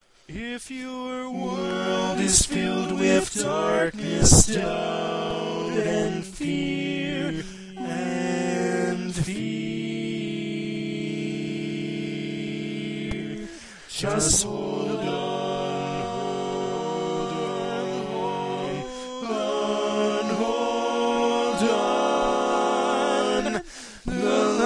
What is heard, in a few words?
All Parts, Barbershop Tags